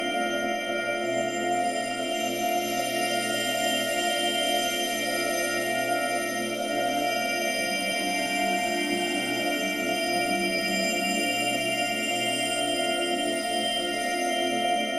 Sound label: egg; all eggs found (easter event)